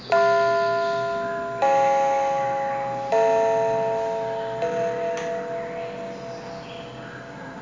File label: Church Bell